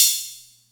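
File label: Atlantex Music Percussion Computer hi-hat closed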